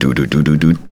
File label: test music